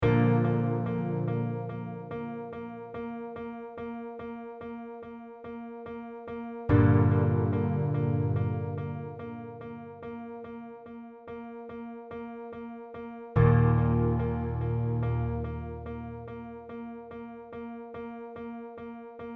Piano Verse

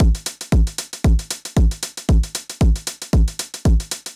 Drum Pattern

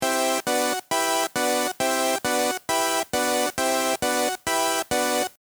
main pad 135 bpm

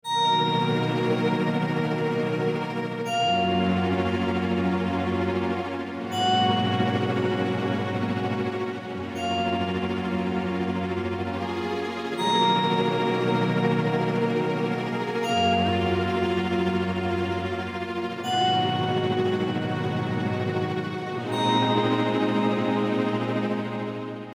string and bell pad

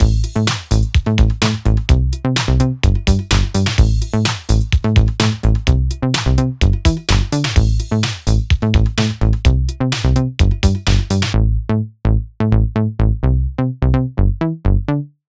Synthwave Bass

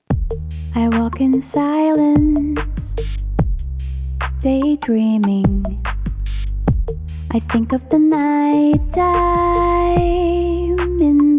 Go Keys 5 RnB Beat

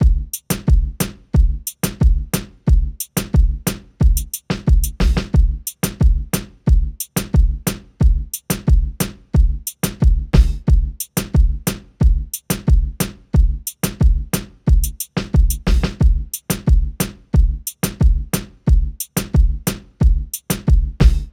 Regaeeton example 90

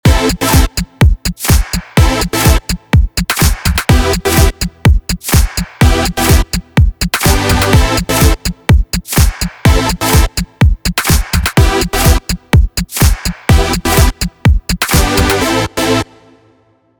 System 8 Supersaw Stab

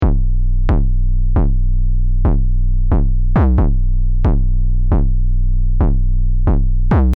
808 bassline

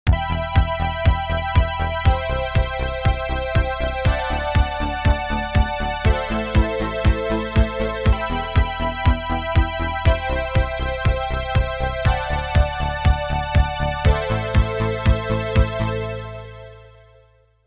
synthwave

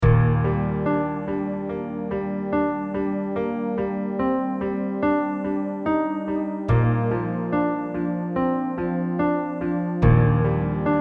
Piano Chorus

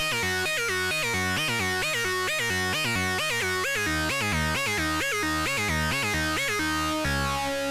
Lead, distortion; Roland Cloud BOSS Effects Pedals

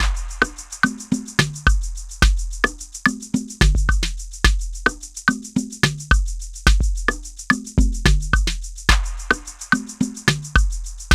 Drums Kick Variation 108 bpm

